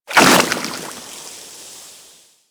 Poison Hit Effect; Warframe

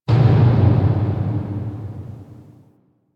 Factory Thud; Warframe; Quests; TheNewWar; Ambience; Zariman

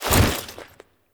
Foley Jump; Warframe; Kahl

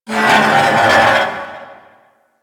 Derelict Metal Scrape; Ambience; Props; Warframe; Orokin Derelict

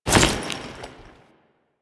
Foley Step; Warframe; Kahl

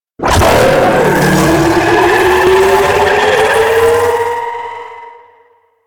Raptor Laser Barrage Charge; Warframe

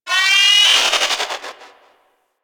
Robot Metallic Textures; Warframe; Venus Open World; Objective Rooms; Ambience

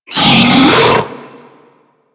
Infested Diseased Ancient Pain; Warframe

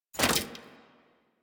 Rifle Mech Release; Weapons; Warframe; Thanotech

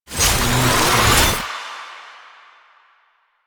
Gunbrella Reload, Weapons; Warframe; Tenno; Koumei

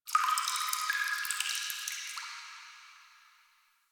Asteroid Drips; Ambience; Warframe; Grineer Asteroid

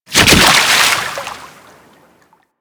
Kubrow Land Water, Footsteps; Enemies; Warframe

